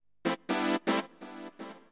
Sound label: SYNTH HOUSE 125 A; eJay; Keys